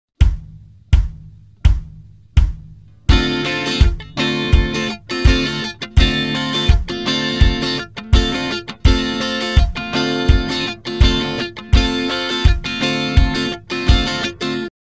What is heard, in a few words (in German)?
slow